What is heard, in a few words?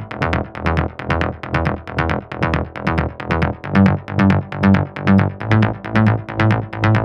Mid Bassline E 136 BPM; Maestro